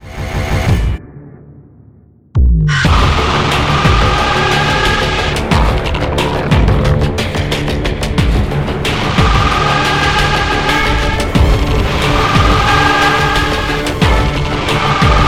Theme Mysterious; Monster Jam Steel Titans

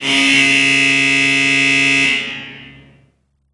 Sfx Basketball

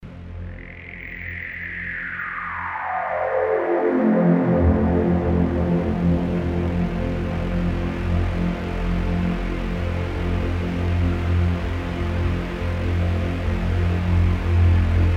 Robot factory music

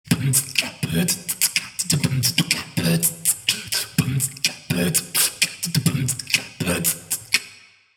beatbox jungle 16 beats

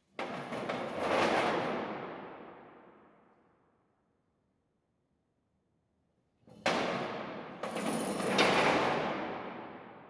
Industrial hall metal hit close mid field; ambiance

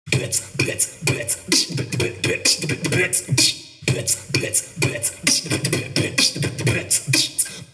beatbox camp 16 beats

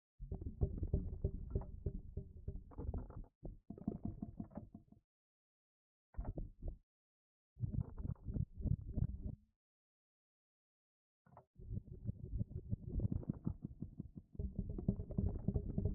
Purple Rumble

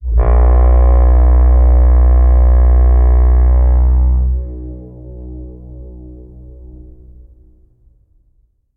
Big Horn Slow Blow

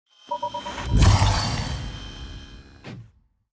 Threat Detection

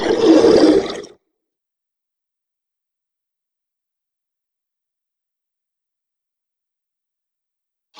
Death Monster

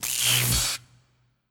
Aerobot Elec Spark

